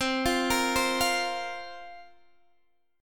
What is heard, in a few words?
Bb Suspended 2nd C